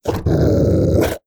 Howler What

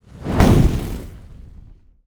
Fire Lord Attack

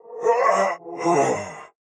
Berserker Death

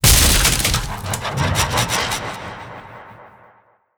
HellGate Death

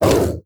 Howler Attack

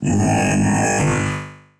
Golem Death

